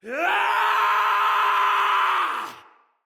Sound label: Battle Cry, Soldier Male; Dendrit